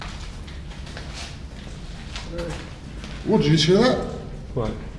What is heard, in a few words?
moan; death row